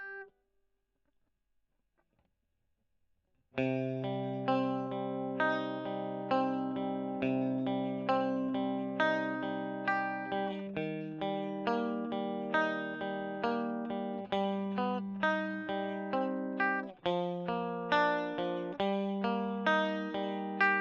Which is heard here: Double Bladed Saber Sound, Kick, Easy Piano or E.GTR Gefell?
E.GTR Gefell